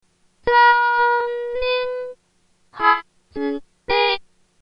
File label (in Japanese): hazure